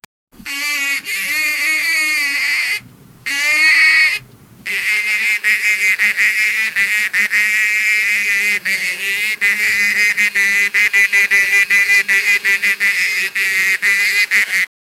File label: giraffe; animal call